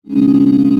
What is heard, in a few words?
arm, mechanical mining